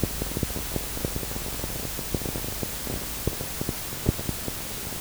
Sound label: Tape Noise